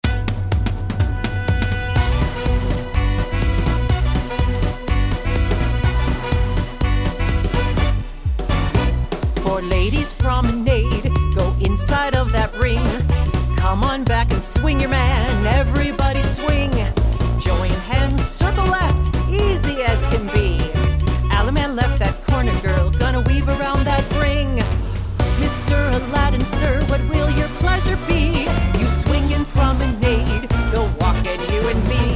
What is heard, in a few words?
vocal sample